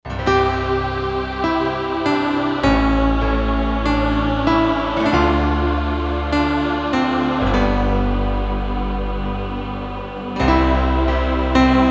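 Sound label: Piano Orchestra; Digital Synthesizer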